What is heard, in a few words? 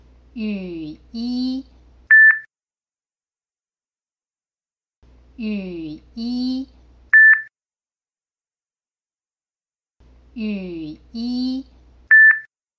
rain cloth